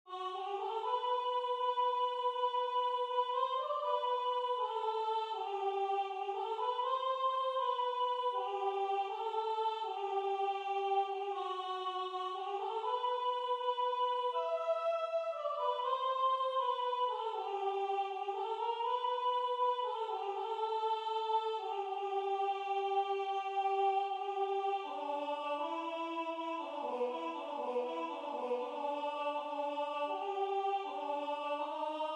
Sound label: Soprano